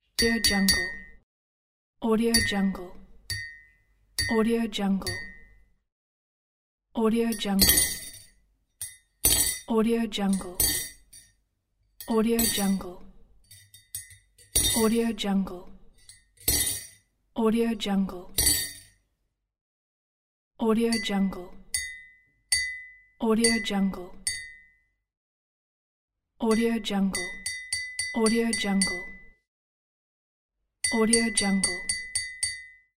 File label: glass clink